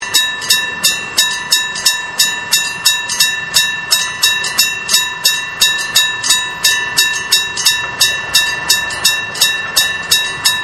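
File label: railroad crossing; fumikiri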